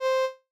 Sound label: Violin; Super Mario Bros. Remastered